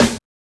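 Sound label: house snare drum; E-Lab; X Static Goldmine 1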